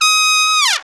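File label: Trumpet Fall, Roland; Club-50 - Foundations Roland; Trumpets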